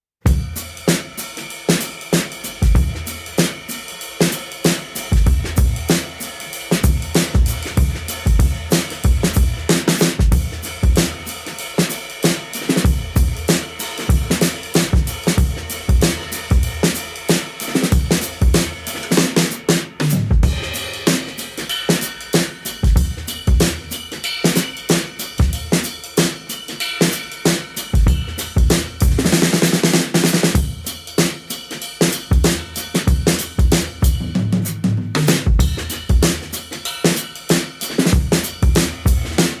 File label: 95 BPM Plant Break